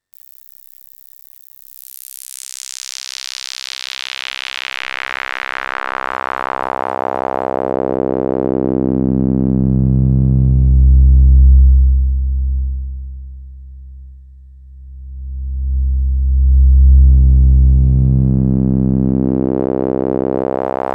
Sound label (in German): Saegezahn Dual Filter 24 dB Bandpass Resonance 50 Stereo Out; IRIDIUM